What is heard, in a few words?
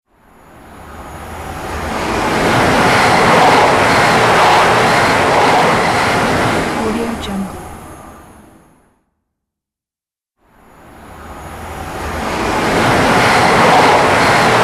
train passing